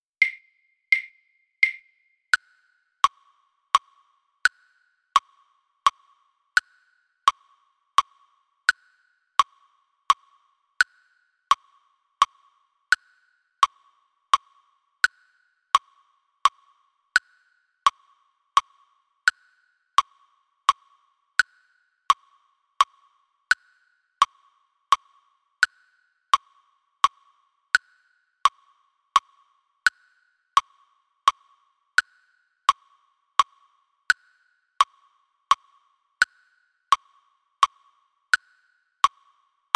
waltz click

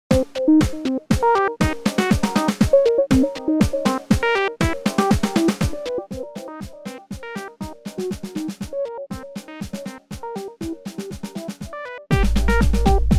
etude snare drum synth 120 bpm

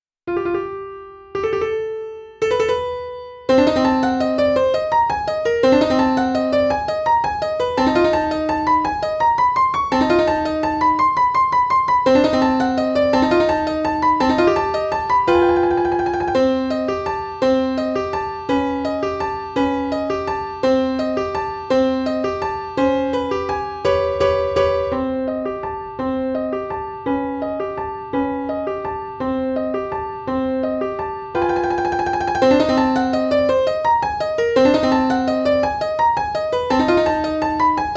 motyl butterfly piano

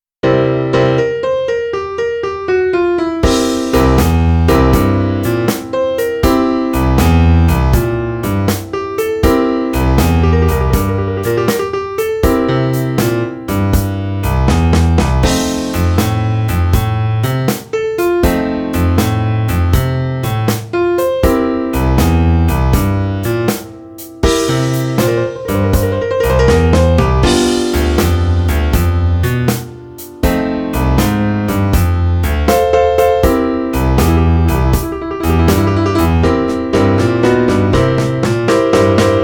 blues piano drum kit

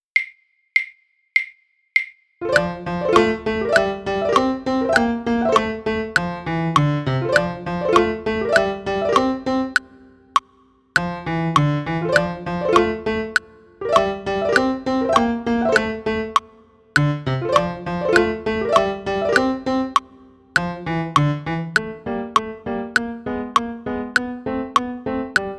piano click; housenka caterpillar

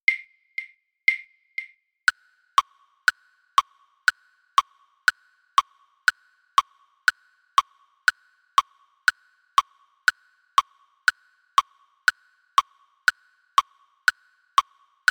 click

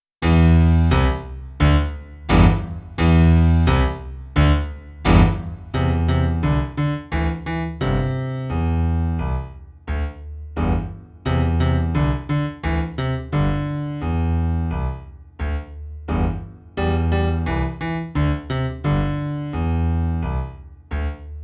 piano; chrobak; dung beetle

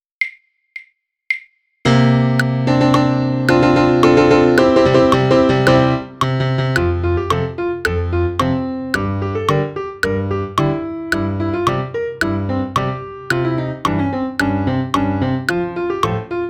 piano click

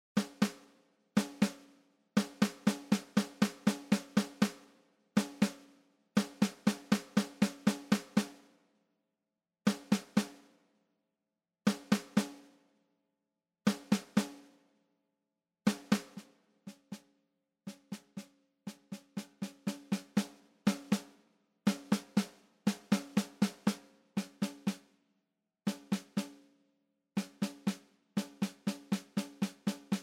snare drum 120 bpm; etude no 3; level i

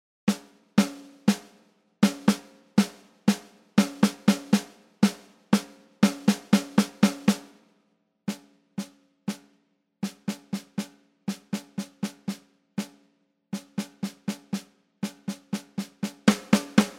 snare drum 120 bpm; etude no 2

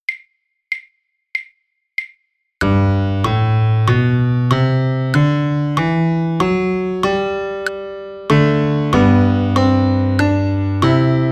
piano click; kopec hill

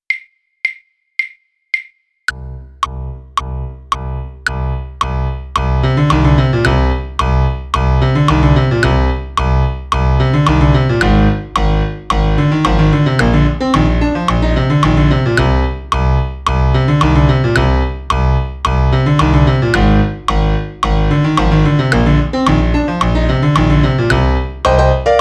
rock piano click

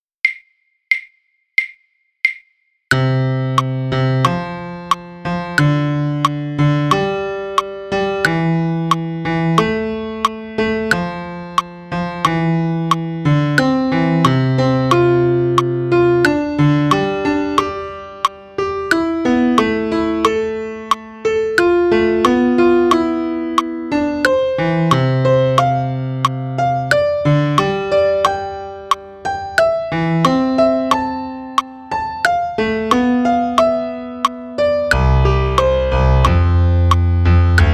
piano click; monkey bars

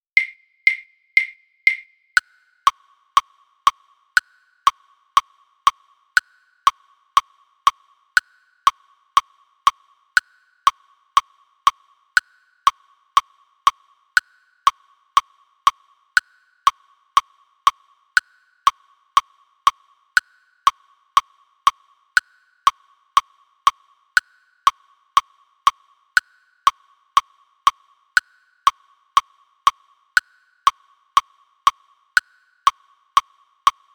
honena tag play click

